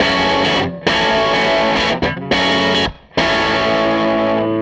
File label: SG Guitar Loop